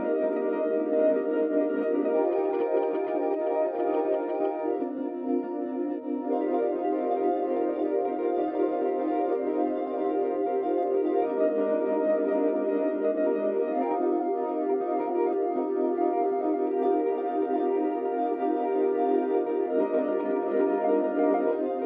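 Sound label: bird vibes recording session, pad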